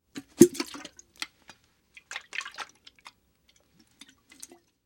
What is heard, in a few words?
Liquid Water Canteen Water Canteen Movements